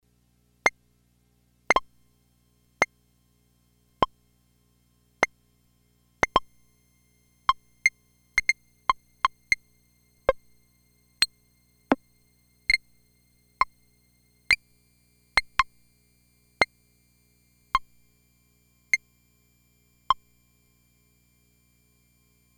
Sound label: Pong